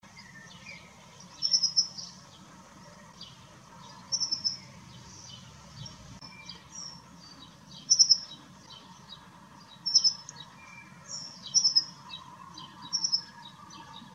pokrzywnica, ptaki; odszumiona

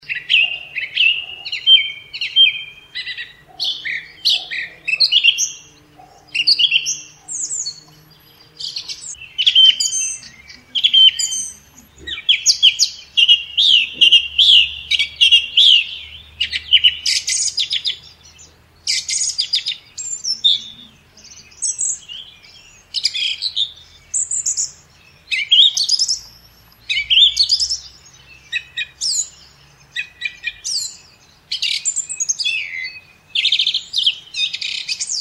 spiewak; ptaki

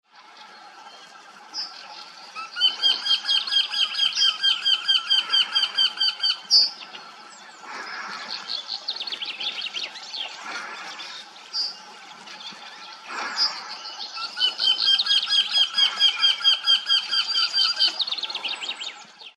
kretoglow, ptaki

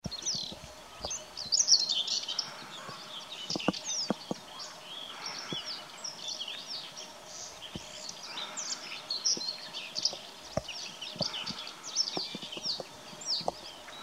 cierniowka, ptaki

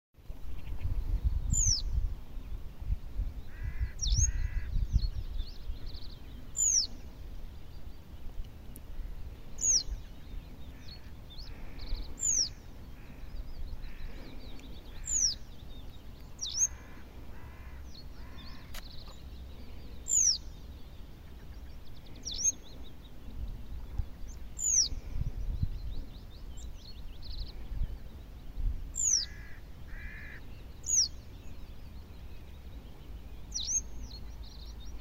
pliszka cytrynowa, ptaki

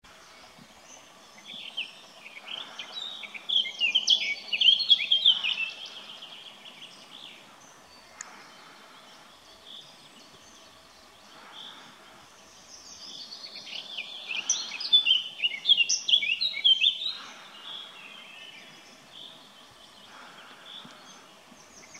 kapturka; pokrzewka czarnolbista

